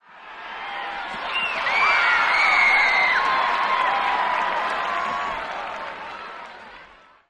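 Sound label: football crowd swell, cheers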